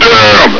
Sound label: bump; Alien Arena; marine